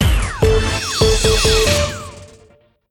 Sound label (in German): Soundlogo; corporate sound; Mannheimer Morgen